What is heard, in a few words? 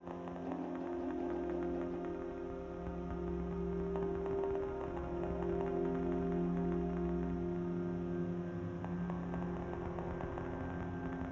Footsteps Resonator Boardwalk